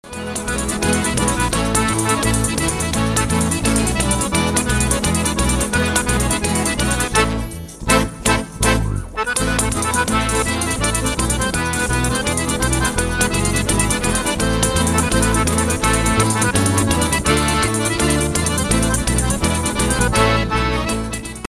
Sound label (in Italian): tarantella dei tamburelli